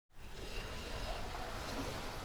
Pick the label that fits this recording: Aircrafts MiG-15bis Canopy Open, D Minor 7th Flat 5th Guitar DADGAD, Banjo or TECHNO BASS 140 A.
Aircrafts MiG-15bis Canopy Open